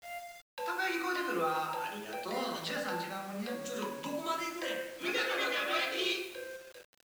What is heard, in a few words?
bgm karaoke; usen; yakuza ryu ga gotoku kiwami 2 pc gamerip